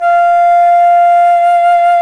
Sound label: FLT C FLT, C Flt Brt; Roland LCDP04 Orchestral Winds; 90 s Sample CDs; C Flutes 3-8